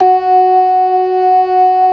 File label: ORGAN, LOWER MANUAL; Keith Emerson Signature Series